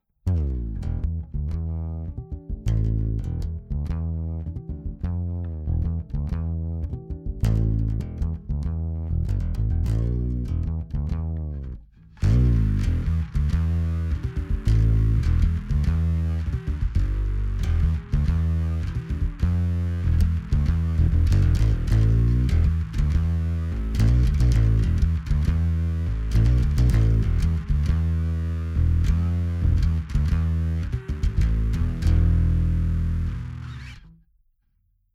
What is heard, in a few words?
BB-1X Godin Blend Bass High Drive